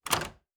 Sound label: Door Open; Survival Game Sounds - Lite Edition; Doors